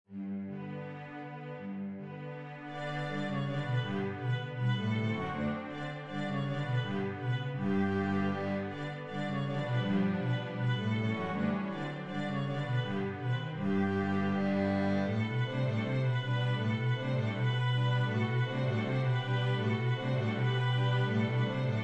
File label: halling, string bass